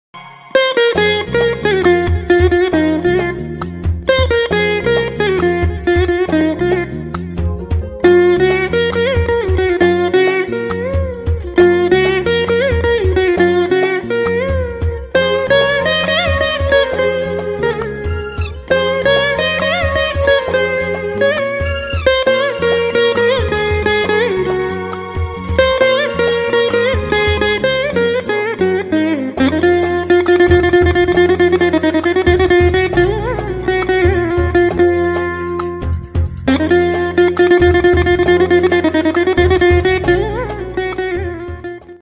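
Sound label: background music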